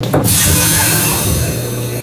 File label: futuristic pneumatic door opening; doors